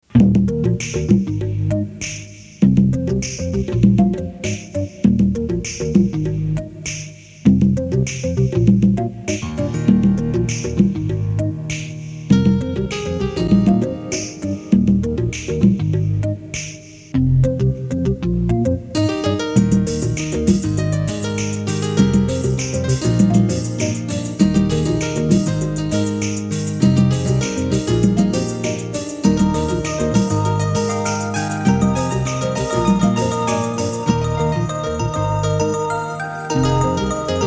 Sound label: piano ditty